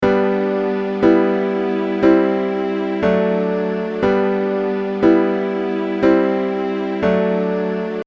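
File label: I vi IV V chord progression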